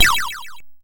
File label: YM Star Fall; SFX; CHIPSHOP DELUXE; CHIPSHOP HITS